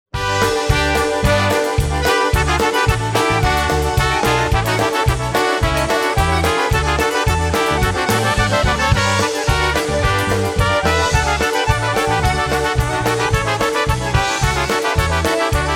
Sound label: Polka